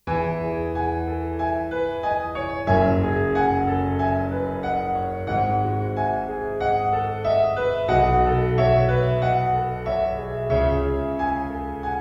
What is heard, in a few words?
piano pop